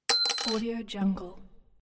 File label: single screw falling on stone surface